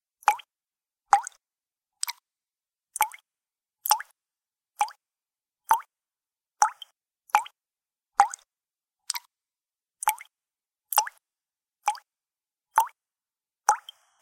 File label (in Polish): Kropla wody